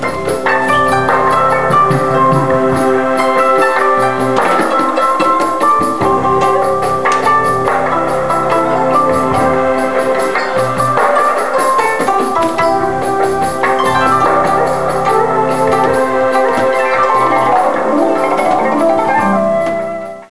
guzhen; kenny

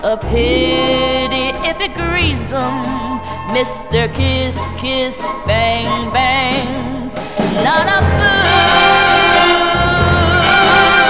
kiss kiss; thunder

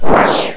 Napalm

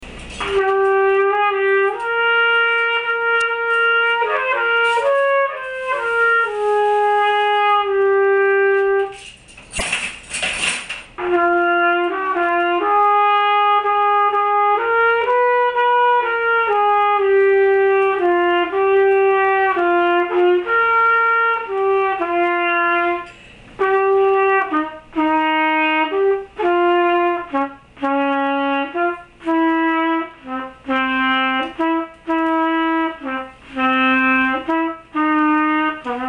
Tone

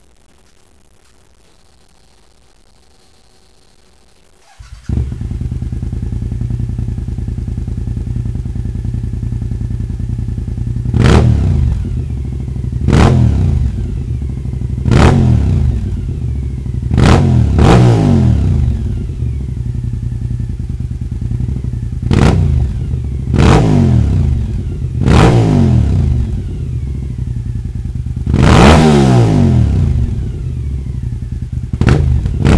warmed up start and blip

